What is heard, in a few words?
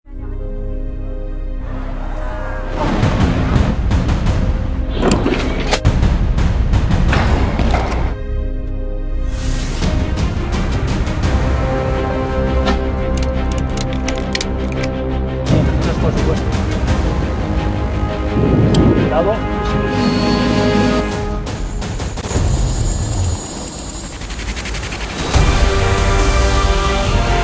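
hang a car from a helicopter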